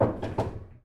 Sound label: billiard pocket